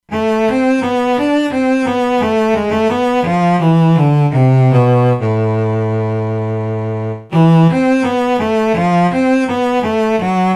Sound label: Solo Cello, E-mu Proteus-2; Factory Presets